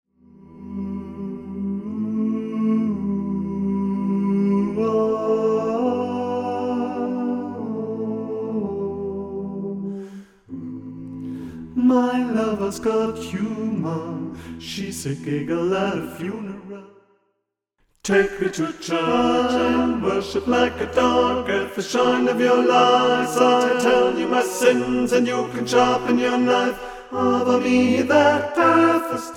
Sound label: TENOR 2